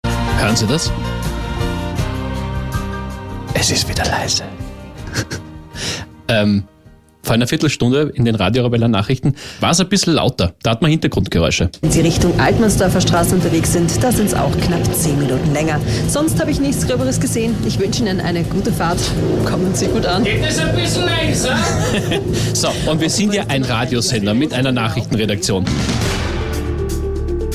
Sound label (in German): Heli über Studio